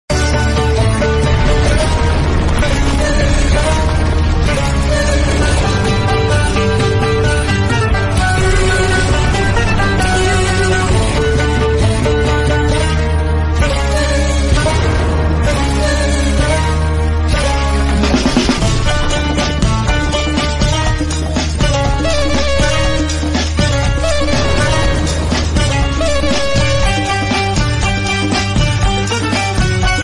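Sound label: Kannada Mass background music Ringtone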